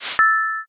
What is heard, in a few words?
sent